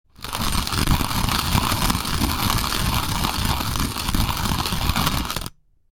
Grind the coffee beans

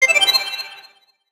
healing magic small; 魔法/法术的音效

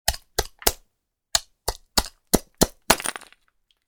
Crushing ice with an ice pick

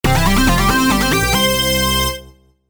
game clear

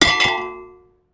Metal; game inn

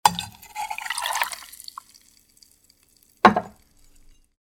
Pour the cocktail into a cup; lifestyle

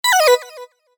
cancel

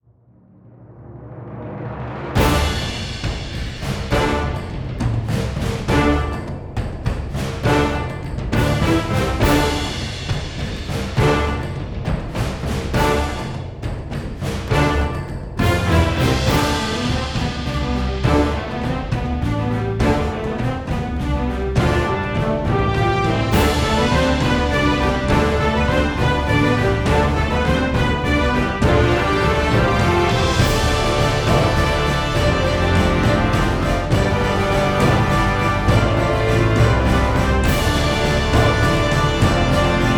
Pirate Attack 102BPM; 海をイメージした; マリン系のＢＧＭ; パイレーツ

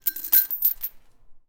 Footsteps Chain

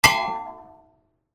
Metal; game inn

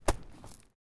footsteps gravel

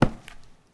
Footsteps Concrete Asphalt Street

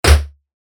drop

